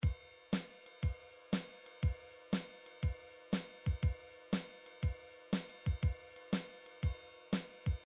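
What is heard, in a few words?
drums rockabilly, midi drums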